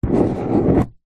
office pen ball point draw shape paper on plastic table